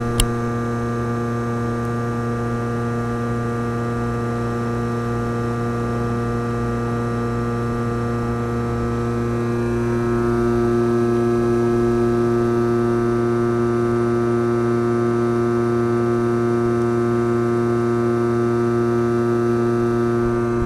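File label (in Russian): электропанельный завод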